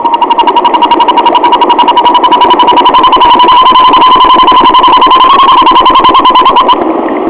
chouette eperviere

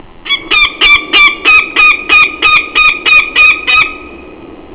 autour, pygarque

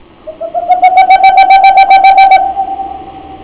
chouette tengmalm

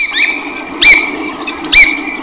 barge hudsonienne

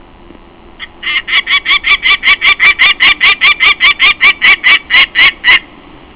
faucon pèlerin

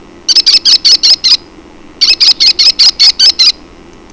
crécerelle

